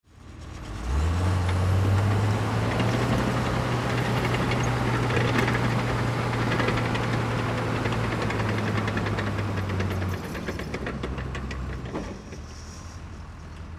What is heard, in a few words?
Bulldozer Diesel Engine Tractor; Construction Vehicles; INDUSTRY; Blastwave